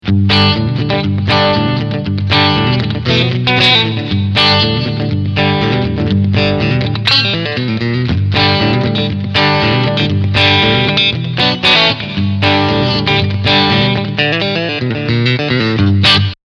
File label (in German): SC und Steg HB SC Mode Clea